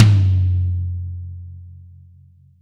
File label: tom 14